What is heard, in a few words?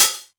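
Hat Open, Novation Drumstation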